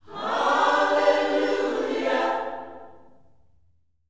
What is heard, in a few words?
HALLELU, Gospel